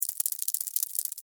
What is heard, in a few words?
Cockroach Run, Animal, Cockroach, Run, Insect, Bug; BlastWave